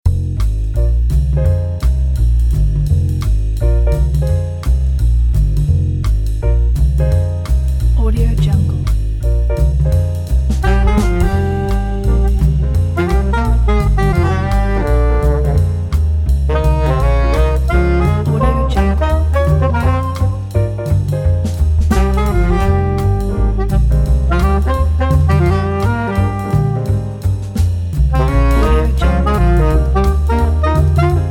luxury lounge smoky night jazz combo

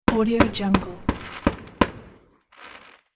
fireworks

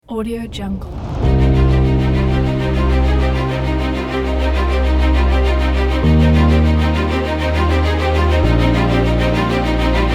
dramatic music

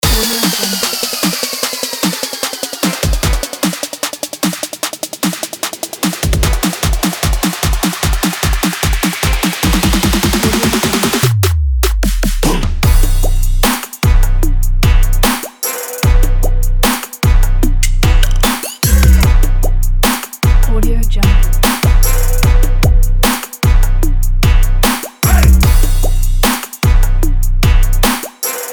for rap

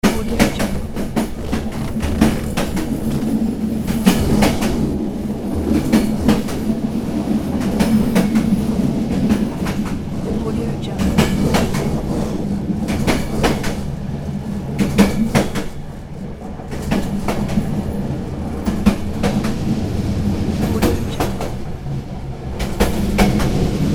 train

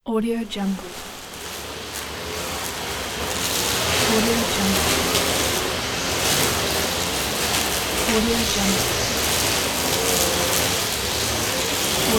lawnmower in the city

